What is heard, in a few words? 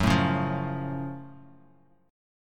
Gb Minor Major 7th Gb down Bass Standard; strummed; JGuitar